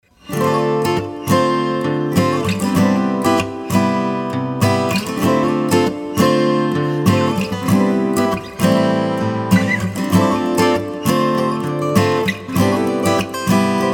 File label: Acoustic Guitar Rhythms